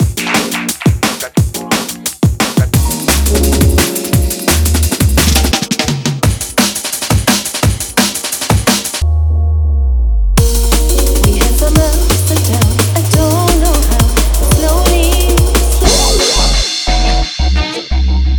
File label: Drum Bass